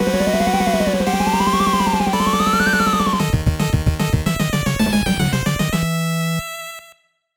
Scene Clear

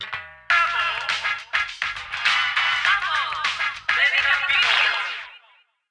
Radio Jingle; nintendo labo toy-con 03 vehicle kit

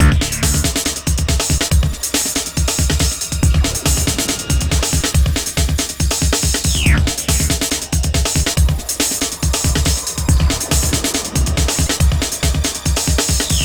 Med Groove 1 Lo; Unreal II The Awakening; Acheron

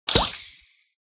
hand launch

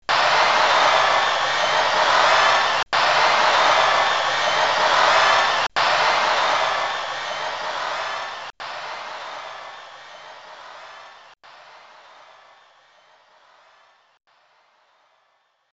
Crowd Cheering; Arm Champs II; arcade

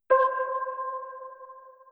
Click; Tap Site

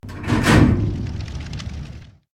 Elevator; Hell; peace death 2017 pc

